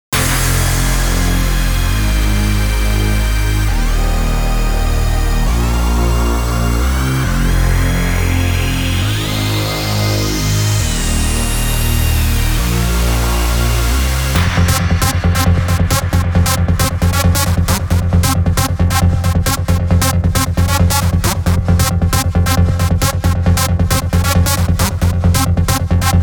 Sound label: Drill; War of Brains; All Game Changer Complete Box